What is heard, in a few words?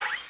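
security camera rotate start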